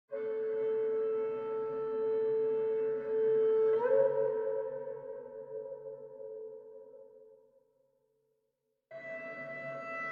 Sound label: Harbour Intro Ambient